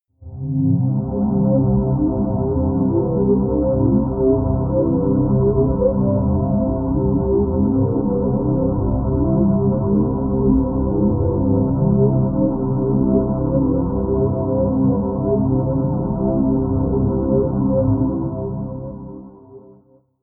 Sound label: pad sweep underwater; flaechen